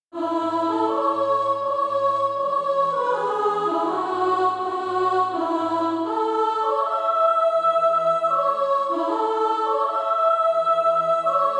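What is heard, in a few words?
soprano, SATB Practise Music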